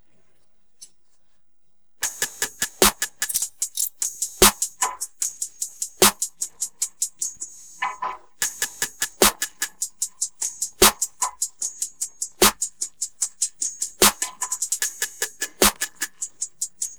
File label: drums